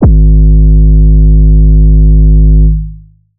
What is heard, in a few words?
808 one shot Ab; Slate Digital BASSHOLE Sample Pack